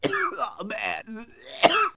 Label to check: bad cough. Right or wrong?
Right